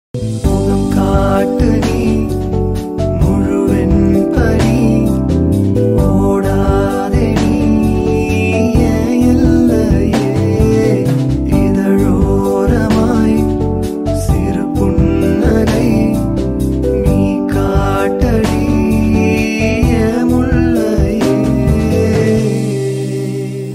one side love song tamil

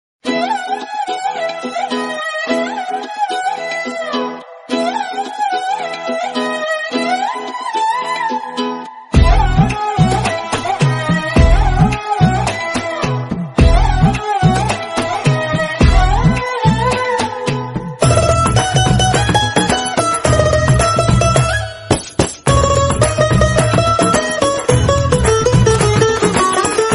telugu ringtone south ringtones

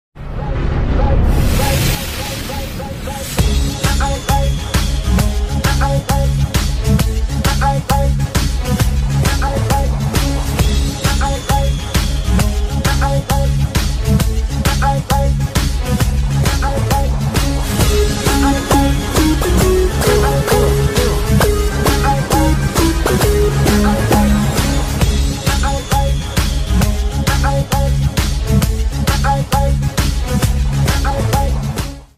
ringtone bgm; sarkar; thalapathy vijay; a r murugadoss; keerthy suresh

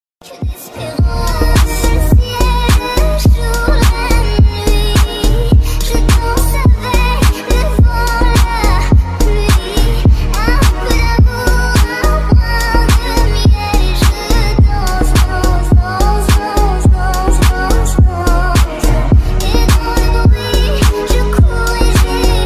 ringtone female voice famous tune bass drops

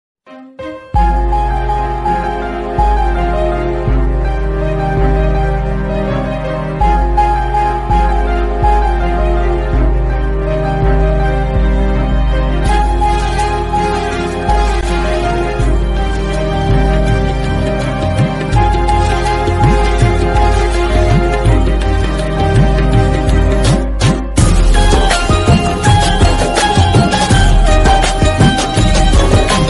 song bgm ringtone tamil bgm; poovellam kettupar movie bgm

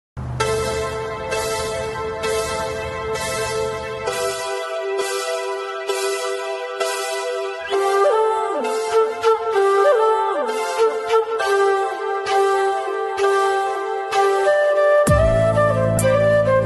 song flute ringtone music

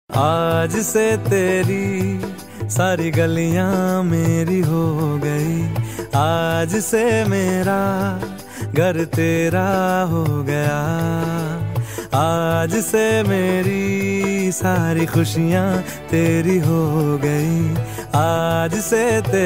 song ringtone, hindi ringtone; padman

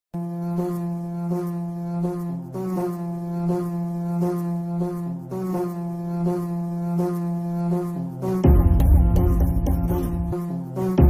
song bgm; kanguva

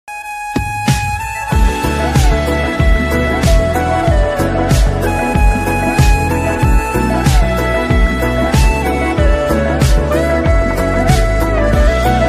flute ringtone flute instrumental ringtone; flute music

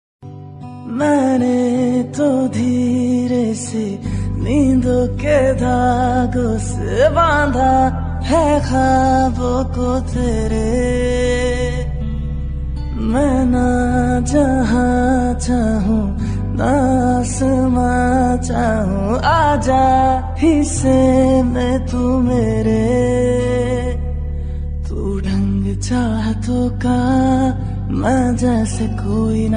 old hindi song

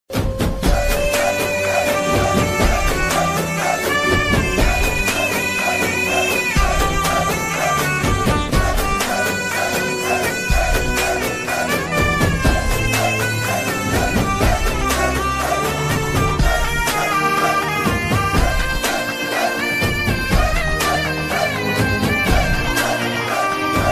raavanan song bgm ringtone

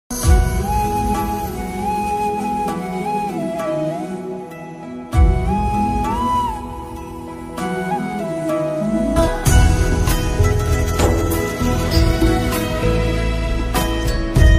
drama ringtone; jaan e jahan drama

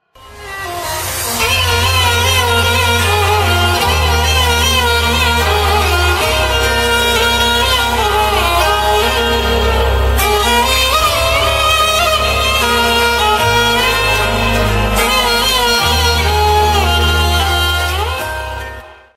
song ringtone instrumental ringtone

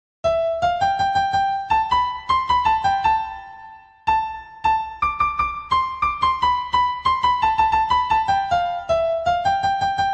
song in piano

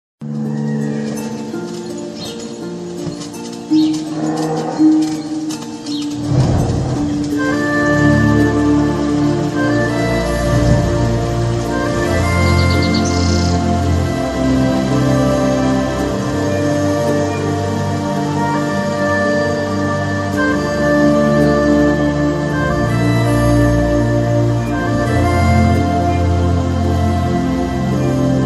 flute bgm lord krishna flute bgm; karthikeya 2

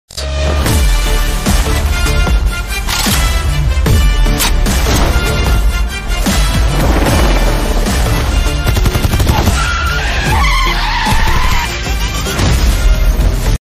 trailer ringtone tamil; goat

